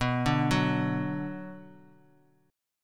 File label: B Minor B; arpeggiated; JGuitar